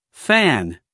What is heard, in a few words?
fan